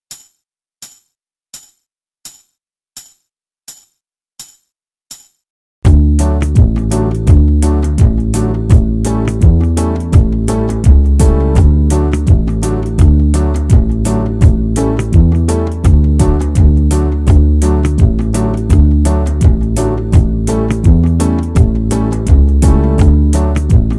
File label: key of d normal, banjo backing tracks